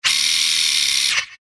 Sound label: Sci Fi Servo Motor Small Robot; Single Rev Long; Blastwave